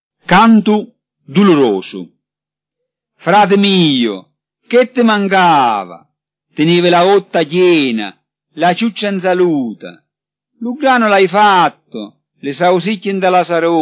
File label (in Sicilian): Cantu dulurusu ( Canto antico)